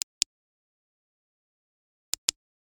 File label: Digital Switch